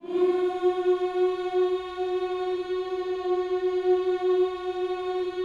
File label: "hmms" F#4, gospel; Spectrasonics; Vocal Planet Vol.1 Gospel; akai